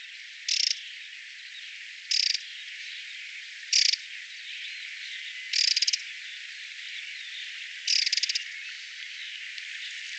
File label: Moltonis Call; Hugh Harrop